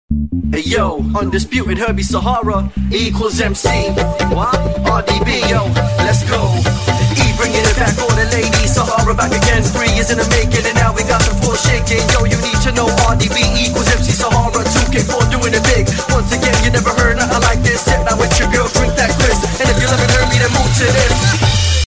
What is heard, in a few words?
remix